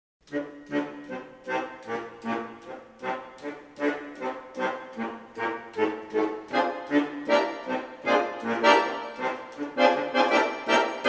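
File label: Basso Ostinato, Akkordeon; V.Vlasov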